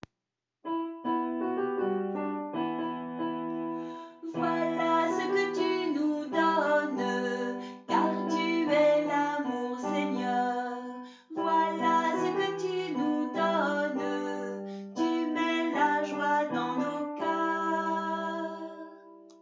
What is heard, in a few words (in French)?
Un merci à chanter (refrain chanté)